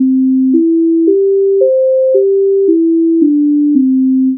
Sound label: piano